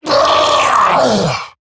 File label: Death Eximus Grineer Male; Battle Barks